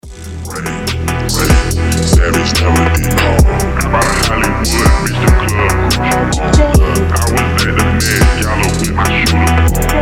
Street Phonk

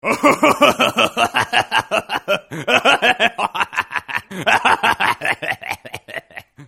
drunk laughing

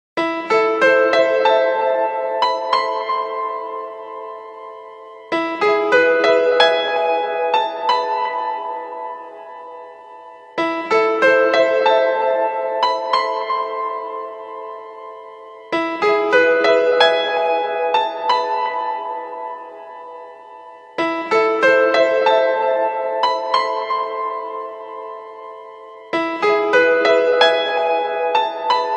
Nokia 8800, рингтон

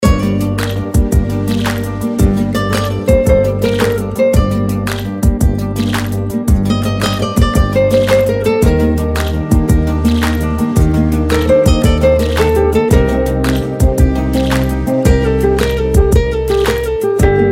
guitar

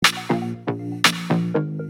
SMS

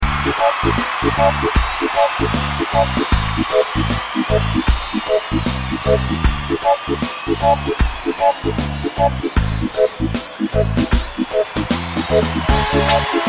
Reggae

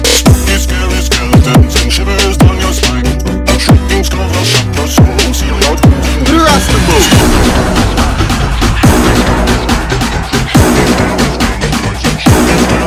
Trap remix

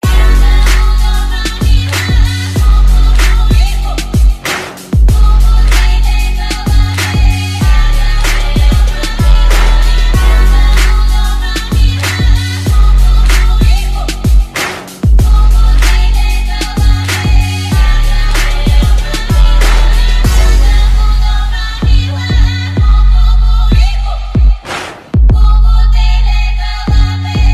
background musicinstrumental bass boosted

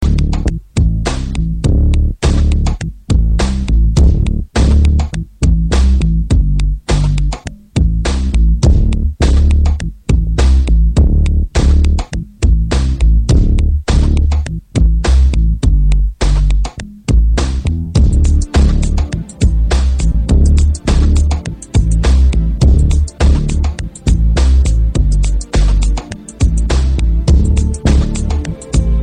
bass

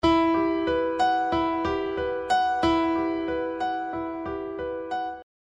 pian0

